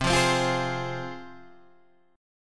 C Suspended 4th Sharp 5th, C, down, Guitar, Half Step Down